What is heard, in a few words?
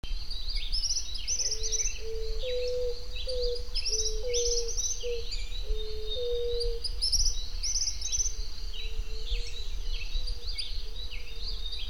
Paloma Ala Blanca, Zenaida Meloda, Pacific Dove; cantos